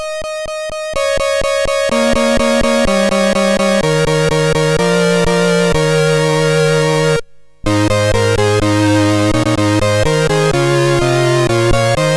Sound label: Jingle Bells, MOS6581R4